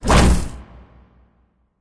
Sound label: lobby victory; Smite; god Janus